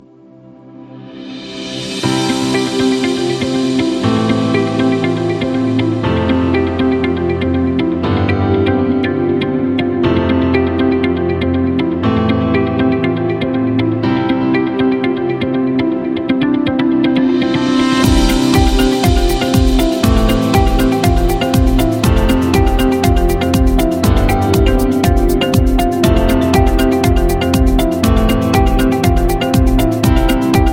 Marketing Digital; eco-friendly

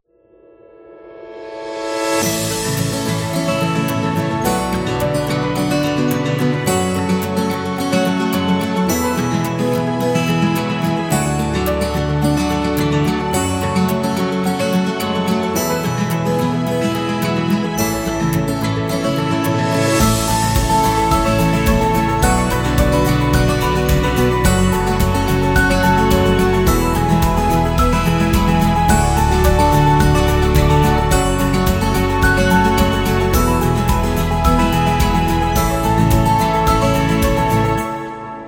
Acoustic Guitar Positive; wedding music

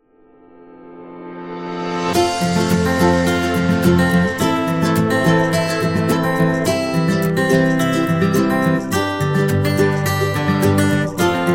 Easy Day, happy music

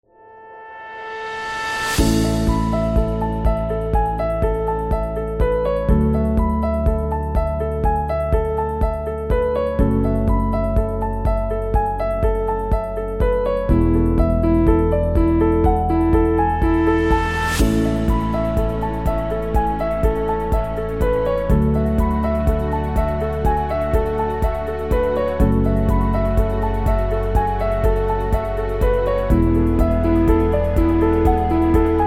Corporate Commercial; corporate music vol 3

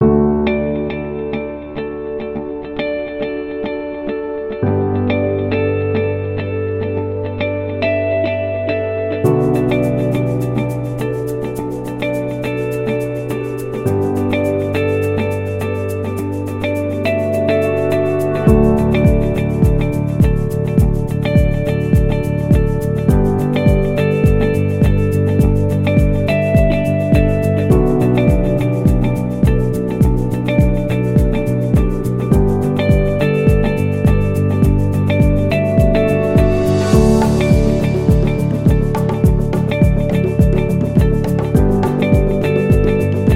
Elegant Ambient; corporate music vol 10

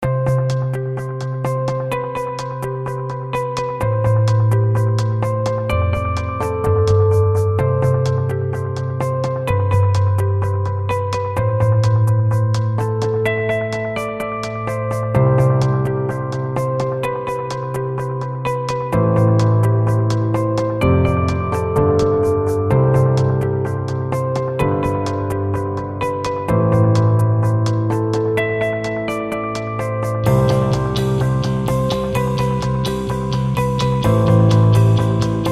Motivating Speech, corporate music; corporate music vol 3

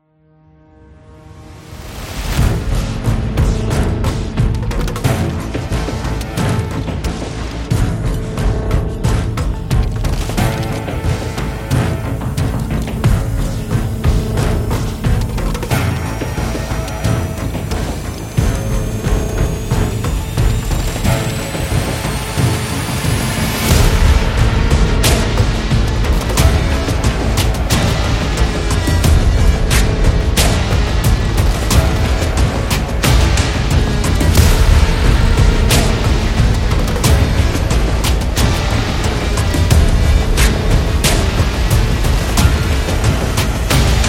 Creepy

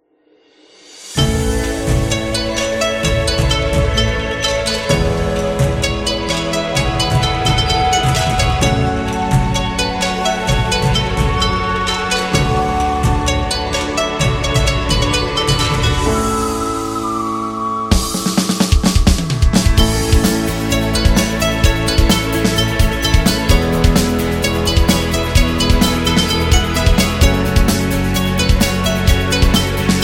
Japanese Rock; sounds of the world

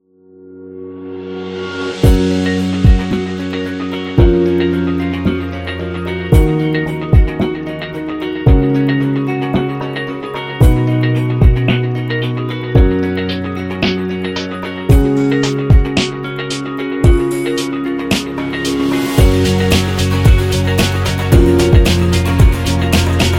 Promotional

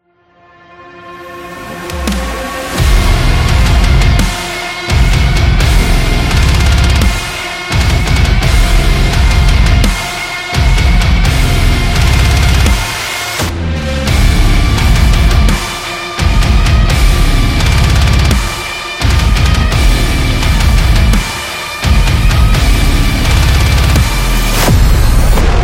Djent

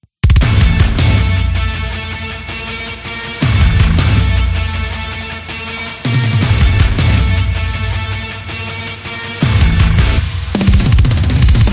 Heavy Groove Nu Metal

